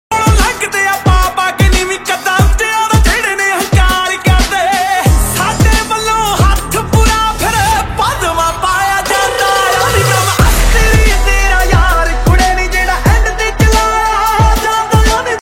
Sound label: Panjabi Song